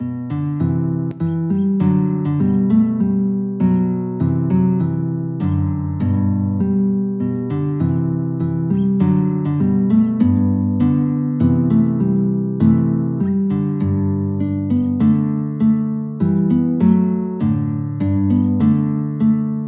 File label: guitar